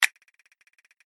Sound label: Regular Click; slots; Outdoor America